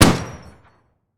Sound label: Freeze, Guns